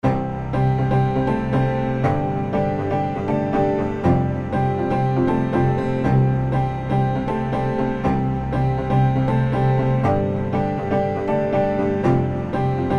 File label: Cinematic Grand Synth Pop Plate; EZkeys; Stringable